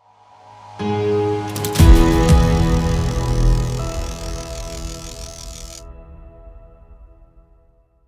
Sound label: arena lose; Escape from Tarkov